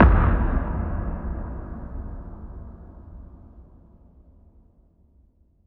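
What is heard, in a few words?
reverse kick; Best Service Alpha Dance vol.2; ZAPS+THUMPS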